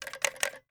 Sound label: Telephone Check for Dial Tone; Communications